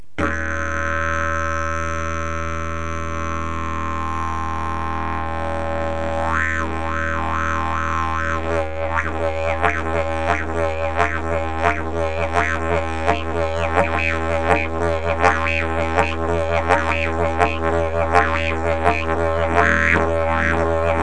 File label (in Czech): jilm, didgeridoo; futura